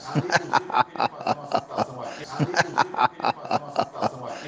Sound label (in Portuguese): risada